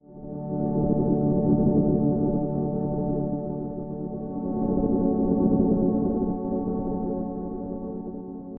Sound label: Distorted pad distant, Cinematic Trailer Loops